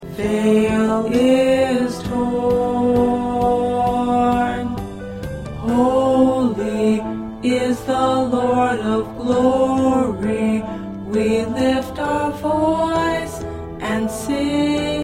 Tenor, choir parts

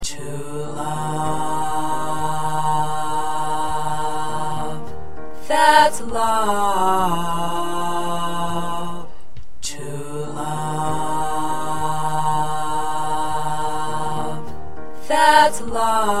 2nd Tenor

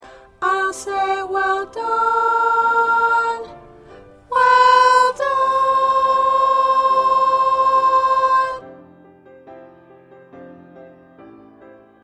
Alto

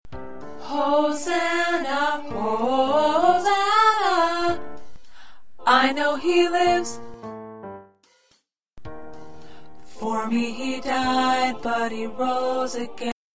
Tenor, choir parts

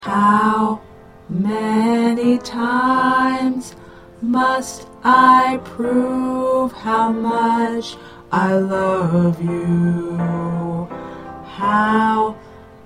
Tenor

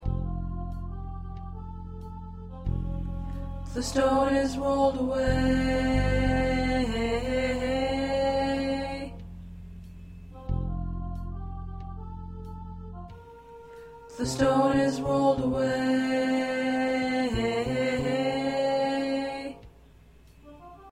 Soprano

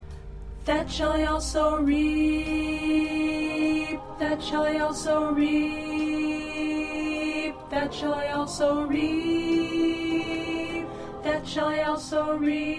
2nd Alto

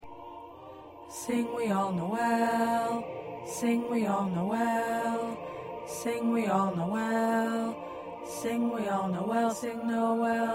2nd Tenor

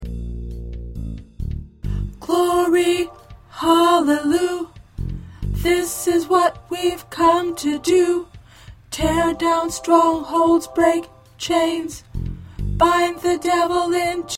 2nd Tenor

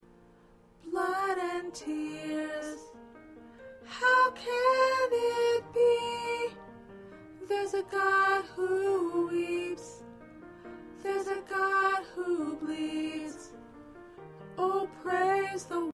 Soprano

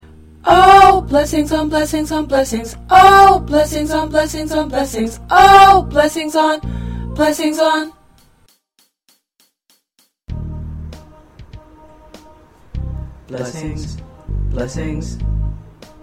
Tenor, choir

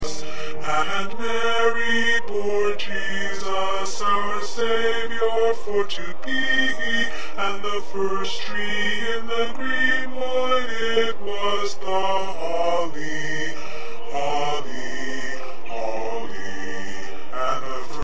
Bass

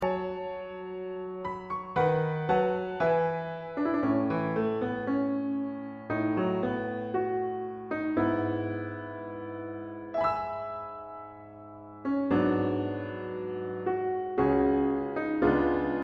Piano

